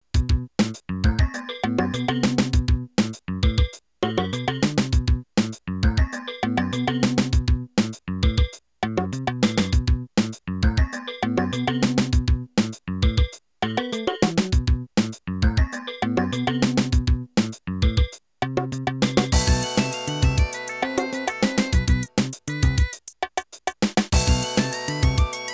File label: robo funk